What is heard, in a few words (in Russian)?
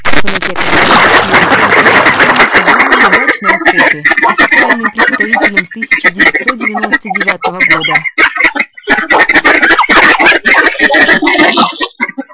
Кряква